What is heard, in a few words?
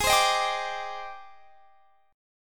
G sharp Major Sharp 11th; G#M#11; x,23,22,20,20,22; strummed